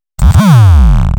FX BASS

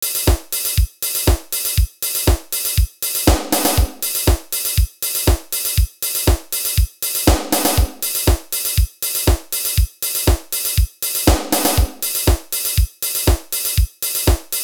acoustic

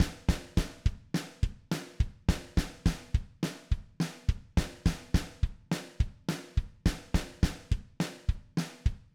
basic acoustic

